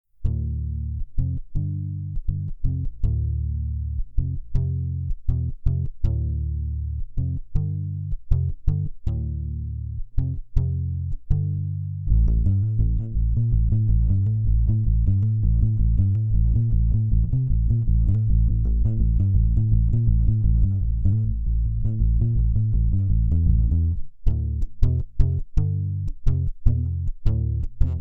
Kala Fingerstyle

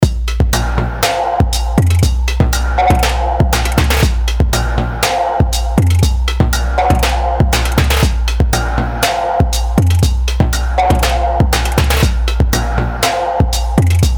Drum Computer Dynamite Kit

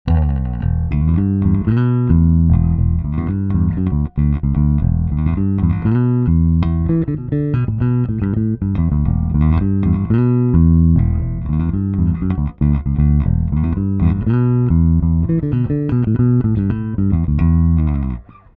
Both passive

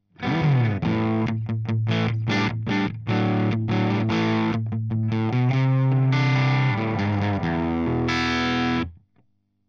crunch; C414

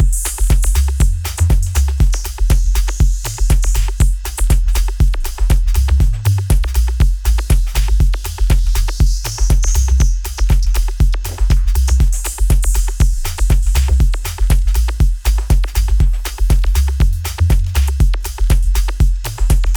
Drums Bass Mixbus Ducking

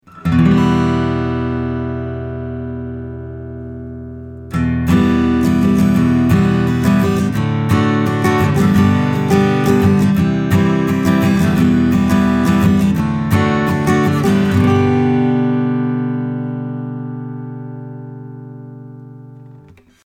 A Guitar; COMP3AJr; Red4; Gain3 5

